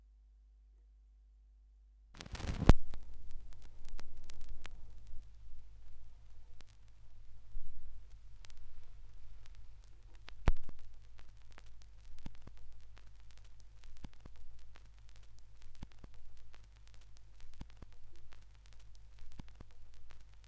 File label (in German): schallplattengeraeusche; rauschpartikel